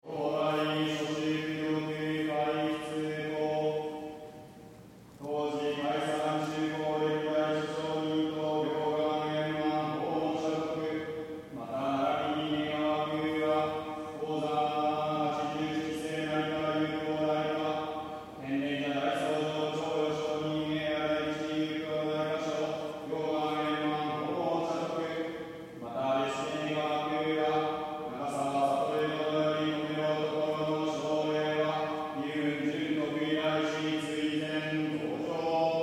Monk Buddhist Ceremony Drone Chant Males Bells Tokyo Japan; Chanting